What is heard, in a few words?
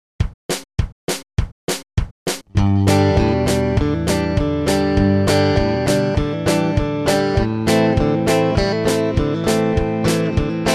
Rhythm Guitar